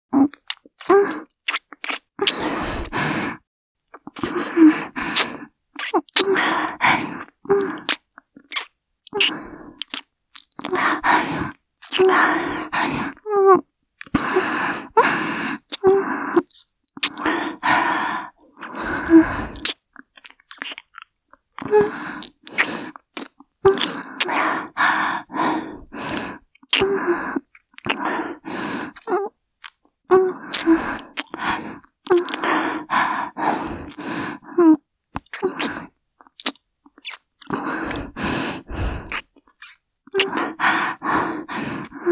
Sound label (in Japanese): 受け身(休憩中ﾊﾞｲﾌﾞ); キス